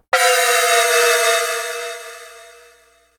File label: crystal; scummvm; The Griffon Legend